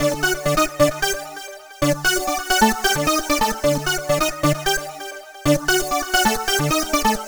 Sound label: Lead Synth